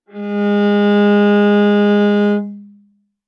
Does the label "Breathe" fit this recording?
No